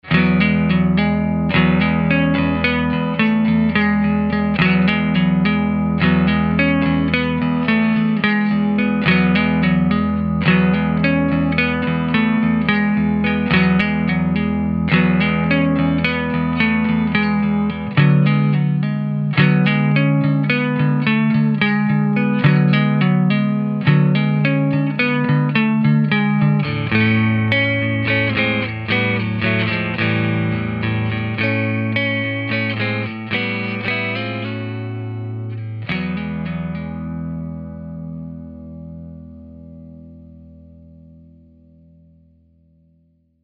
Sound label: vibrato; WaterFall